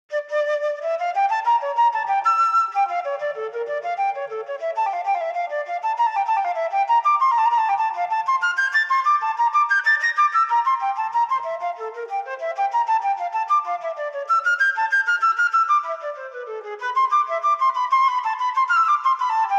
Allemande from Violin Partita, unaccompanied Flute; Violin Partita no 2; Andy Findon; Johann Sebastian Bach